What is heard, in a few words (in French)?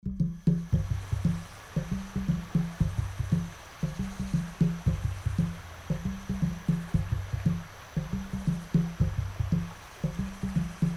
SANS VOIX